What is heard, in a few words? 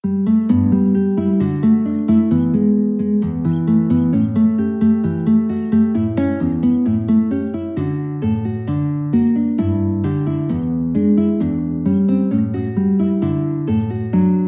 guitar